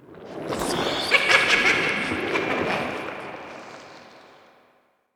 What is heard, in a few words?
ambience aquelarre